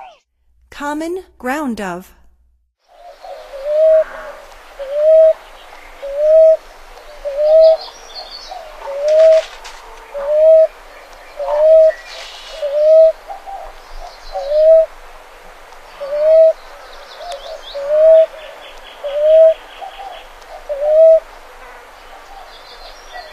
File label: Common Ground-Dove; North America; Stokes